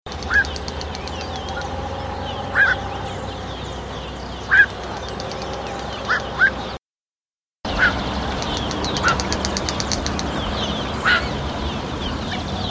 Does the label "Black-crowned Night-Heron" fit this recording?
Yes